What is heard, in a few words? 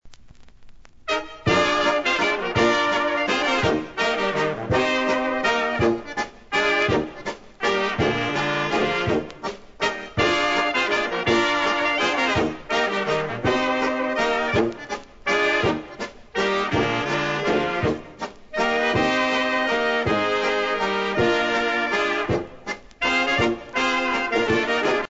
waltz